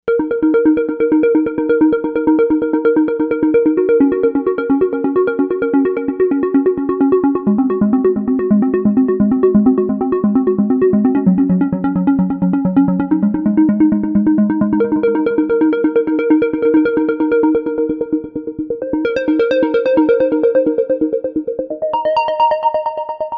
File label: Rubber Bounce, Novation UltraNova; Factory Patches